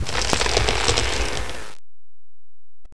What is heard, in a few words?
entangling roots; uwc3x